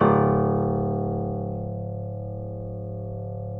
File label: keyboards acoustic C#0; USB Soundscan vol.17; AKAI